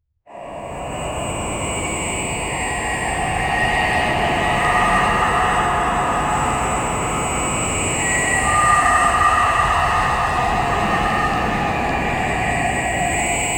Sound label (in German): dramatische dstere demoni, demon